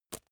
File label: magazine tap